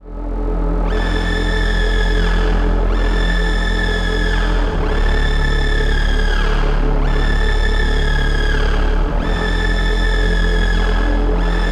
Erica Synths Syntrx II Dronespielerei

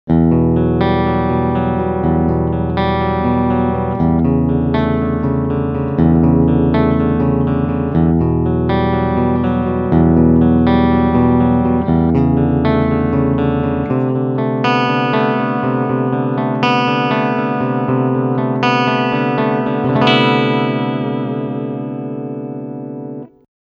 Clean picking Steg humbucker

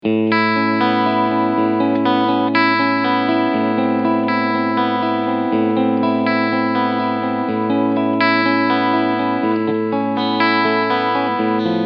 Cleansound Mitte Steg Pickup; Ibanez ATZ100 SBT

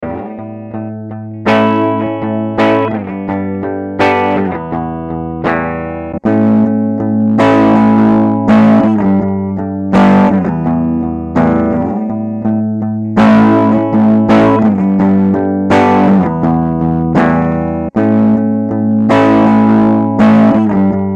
Boss EQ 200 Mountain Zerre

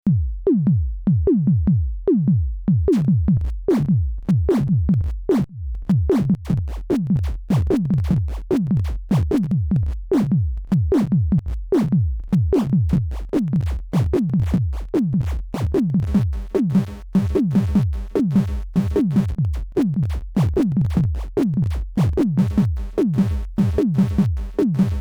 Sinus Beat FX; Doepfer Dark Energy III

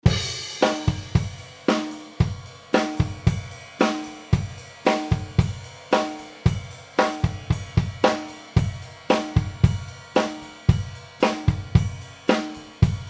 Drawmer 1972 Drums EQ Shapings dann Lift Bounce Pan Mitte

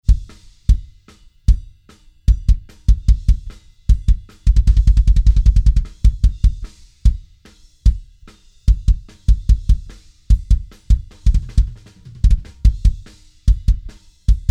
Tube Tech PE1C BD Mikro aussen LF Boost Atten 8 bei 60 Hz HF Boost 9 bei 8 kHz

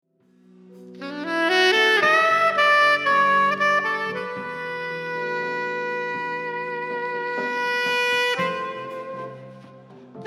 Sopransax

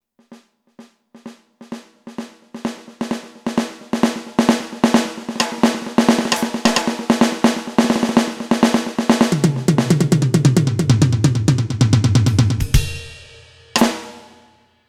Mesh Kit Dynamik; ALESIS Nitro

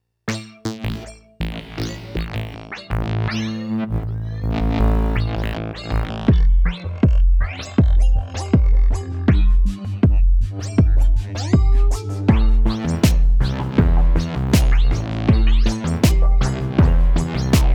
MODX Elektro Beat AWMFM

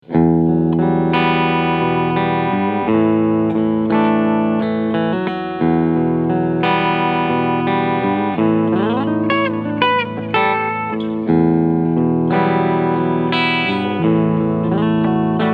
PRS SE Hollowbody II Steg Humbucker Cleansound

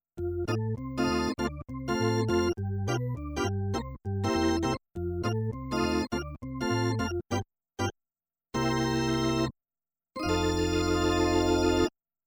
Numa Stage Orgel

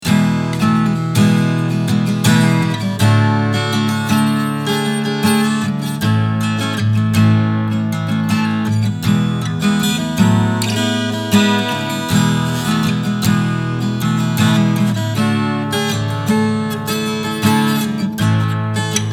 Strumming Mikro

